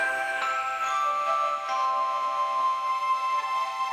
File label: magic sparkle; sound effects